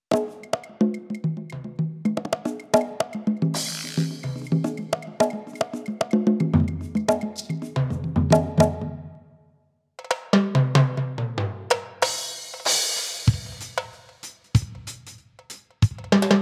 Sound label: Strike Pro percussion sounds